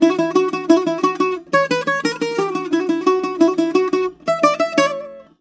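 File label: F#, bouzouki; USB Soundscan vol.53; Mediteranean Traditions; AKAI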